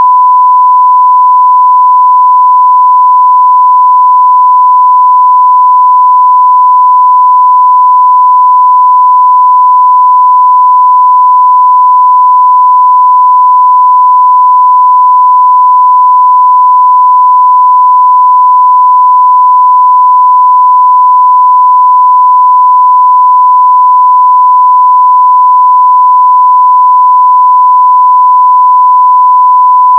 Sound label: 1kHz; Audacity